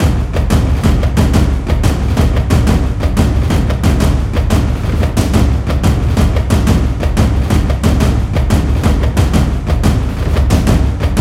90BPM, Percussion; Ghosthack; SkyRealm; Percussion Kits; Under Siege

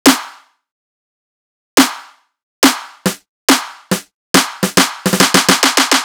Riddim Drum Loop 140 Snare; Ghosthack; Riddim Essentials Volume 2; Full Drum Loops

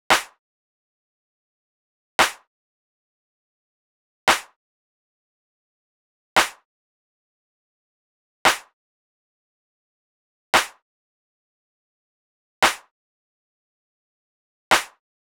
Drum Loop 115 BPM Clap; Loop Stems; Cymatics; DOPE DRUMS VOL. 1; Coffee Drum Loop

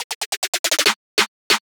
Riddim Drum Fill 140

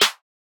Snare - G#, Hip Hop; Drums - One Shots; Cymatics - 9God Hip Hop Sample Pack

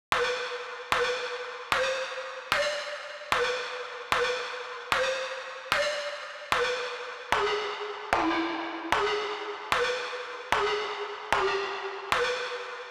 150 BPM E Min Accents, Future Bass; Cymatics; GHOST (Beta Pack); Destination

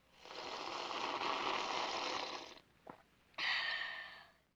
Child Soup Slurp; Vintage Cartoon; Comedy Vocals

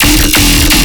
Bass Hit E, Dubstep

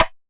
Raptor Perc, Dubstep, Percussion; Drums; One Shots; Cymatics; Dubstep Starter Pack

